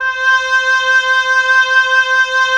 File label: WND OB F, Oboe f; Roland - LA Composer vol. 4